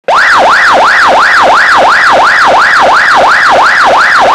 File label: YELP, sirene; sirene orbe